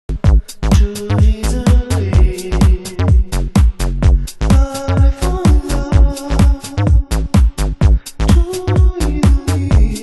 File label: epic